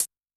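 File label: Tek Close, HiHat; Yamaha DTXpress-II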